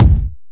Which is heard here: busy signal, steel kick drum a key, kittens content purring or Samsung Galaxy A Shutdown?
steel kick drum a key